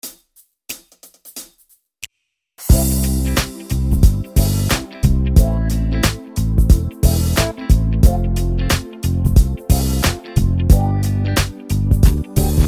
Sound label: Chord Exercise; Chord Exercise 1A